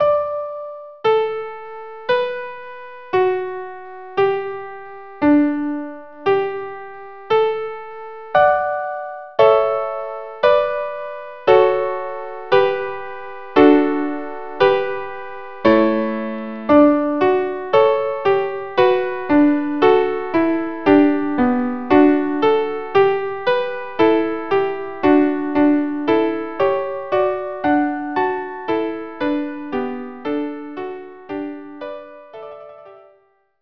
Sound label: canon violin duet; pachelbel